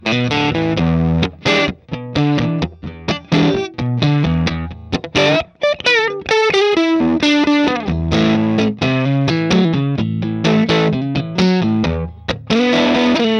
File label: ecc81 6l6 blues; uraltonejr